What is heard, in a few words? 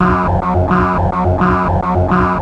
sample hold, synths, dancerap; The Sample Bank